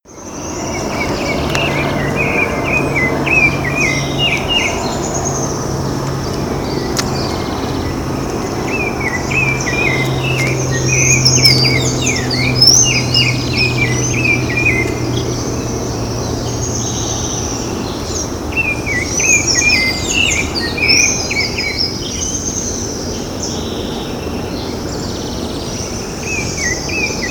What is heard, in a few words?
Turdus rufiventris; Ibirapuera Park; SP; Brazil; Silveira